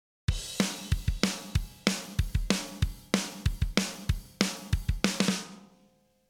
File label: drum On